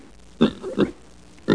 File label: Various pig snorts